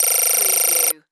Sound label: Satellite Zoom Out; sound effects bible